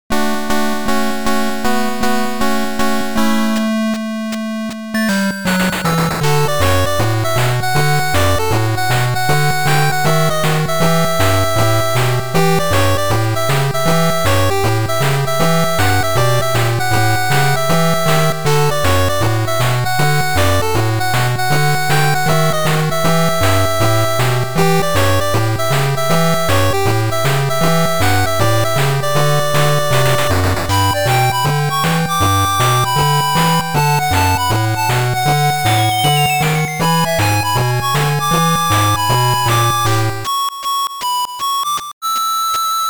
8bit Christmas Swing

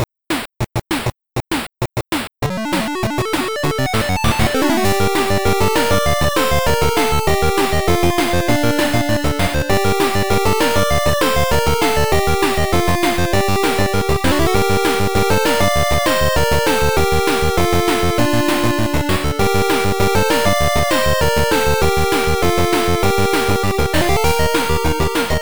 8bit

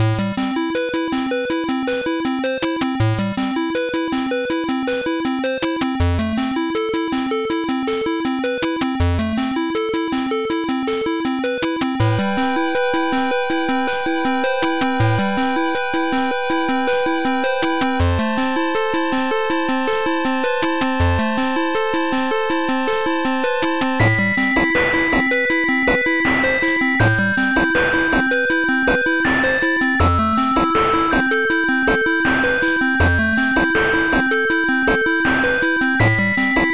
8bit

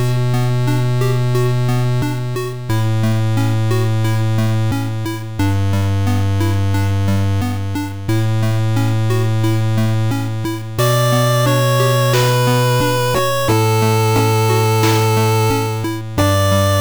8bit

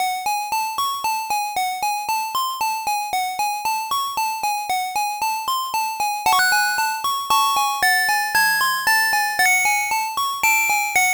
8bit Abandoned Mansion Music Box Version

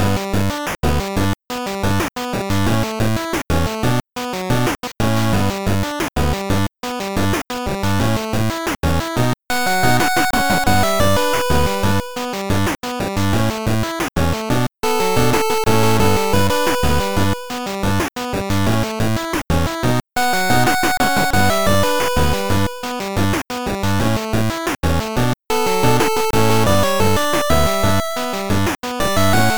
8bit

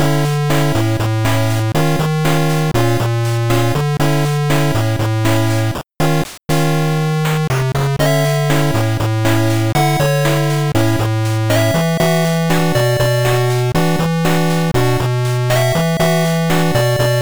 8bit Afternoon Bossa

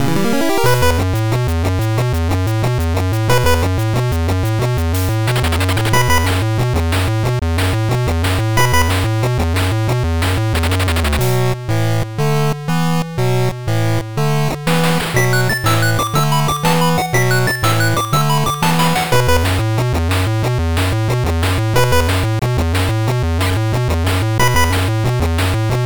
8bit Battle On

